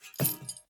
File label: Censer drop; Phasmophobia